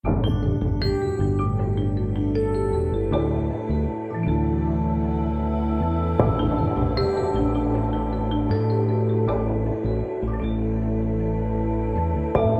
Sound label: Liquid Bells